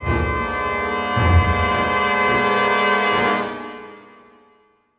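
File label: fairground 116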